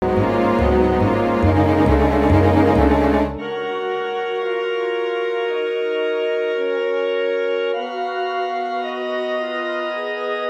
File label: Instrumental Track; auditions